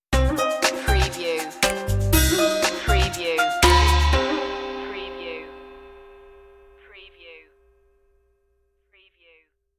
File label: stinger